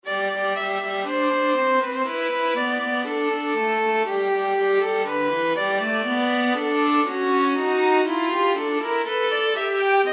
music for two con moto

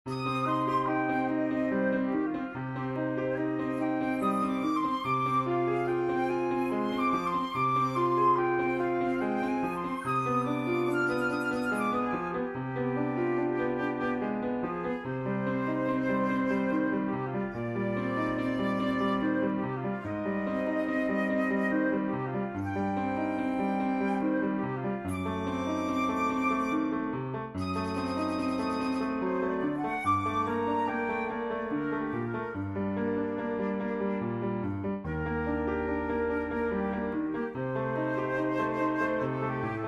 flute piano; magatagan mike; aria

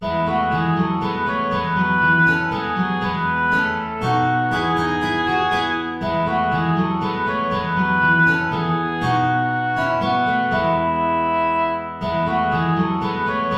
saltarello; praetorius michael

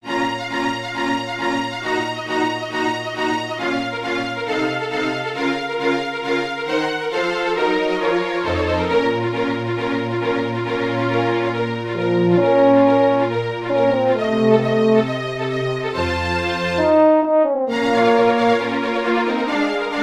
for horn strings; haendel georg friedrich; all they that see him laugh him to scorn

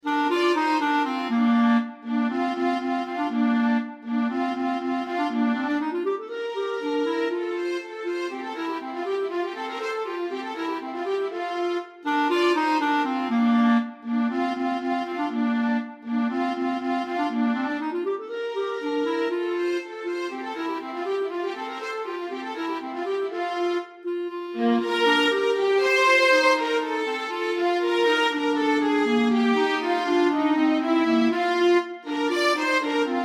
horn duos

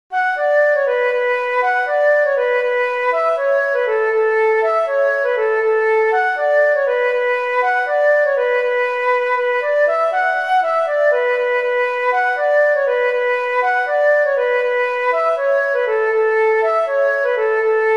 march for flute oboe harp; traditional; brian boru

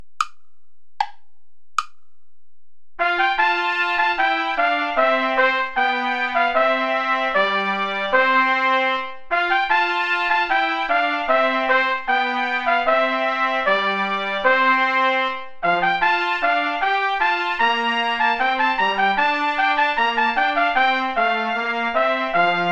bransle gentil play along without trumpet; praetorius michael

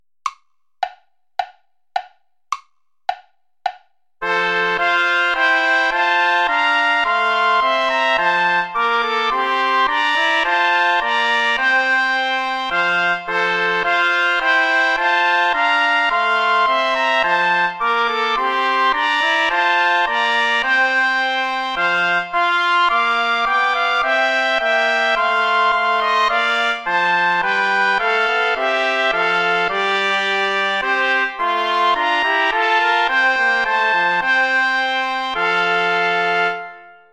play along without trumpet

